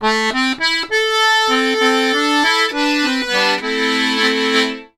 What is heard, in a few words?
Complete Accordions; USB Soundscan vol.40; AKAI